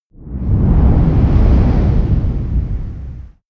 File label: whoosh mid tonal